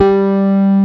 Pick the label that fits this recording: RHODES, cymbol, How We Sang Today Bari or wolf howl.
RHODES